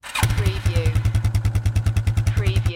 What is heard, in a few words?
Quad Bike Start Up Exhaust Perspective